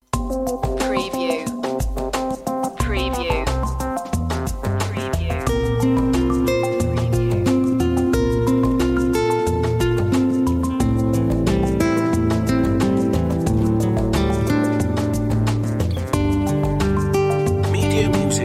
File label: strings into life